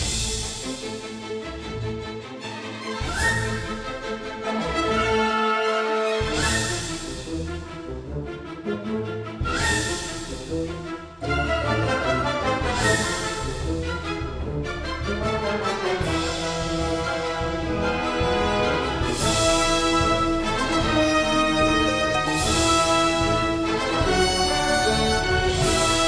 Chase Layer Melody; tintin reporter cigars of the pharaoh

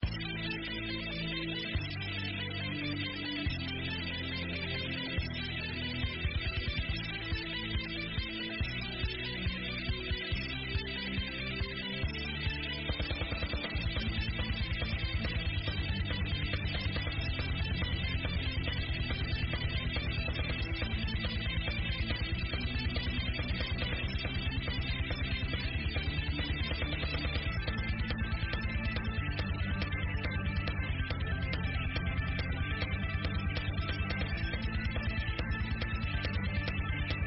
bgm boss; Crunchtime

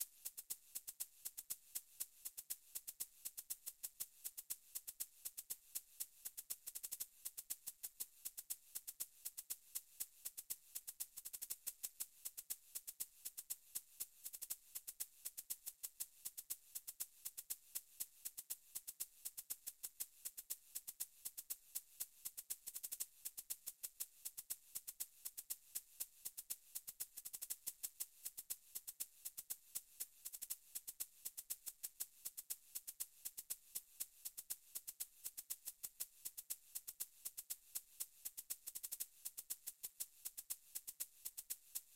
SHAKER

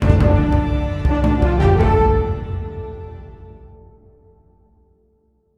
card follower; Armello The Bandit Clan

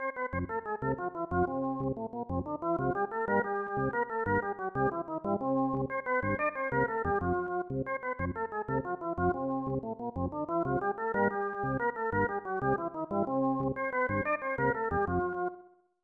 Mexican Hat Dance; Cal Ripken Jr. Baseball; SNES

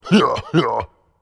Brute Cheer; Character Brute Cheer

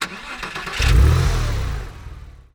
vehicle sportscar ignition; teardown; ps5 windows xbox series xs

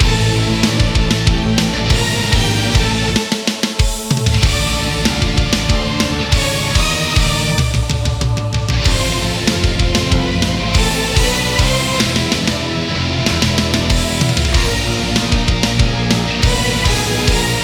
Loop

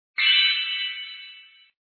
sfx intro; Mighty Knight 2

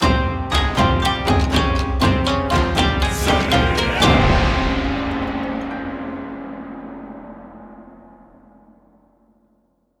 Mission Complete; Akaneiro Demon Hunters Original Soundtrack Part One

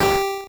squish; earthnight level

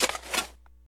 Seed Planting; Plant Tycoon; macos mobile windows

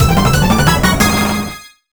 Transforming Stone; the legendary starfy; nintendo ds

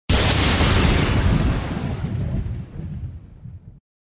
Sfx Transformation Thunder; Mighty Knight 2

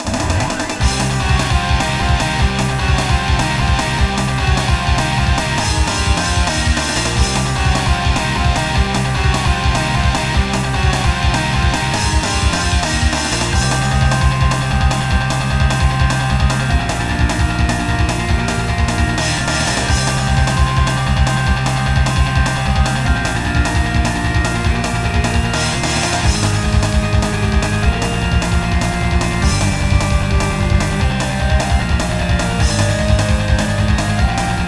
Heavy Metal

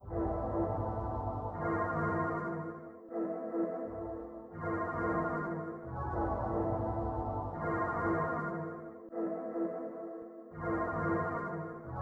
Happy Town D ambient

